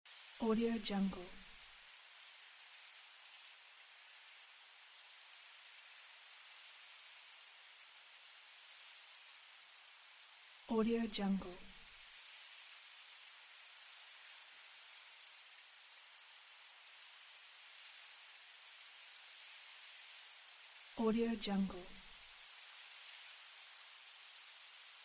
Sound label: grasshopper insect sound; prowaveaudio